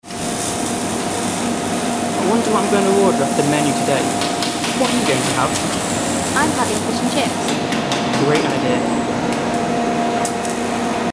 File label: Workplace